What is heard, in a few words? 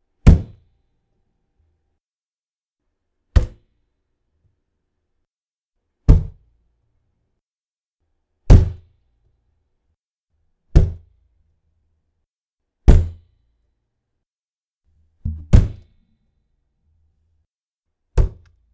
Thuds On Window